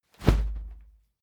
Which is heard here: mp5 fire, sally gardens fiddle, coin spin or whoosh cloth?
whoosh cloth